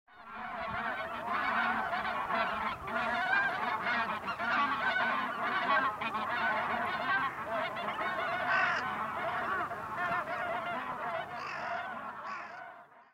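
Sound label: Bean Goose; ヒシクイ; 亜種ﾋｼｸｲ; 宮城県平筒沼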